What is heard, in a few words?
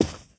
carpet, footsteps